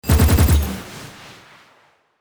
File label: automatic weapon ultra long burst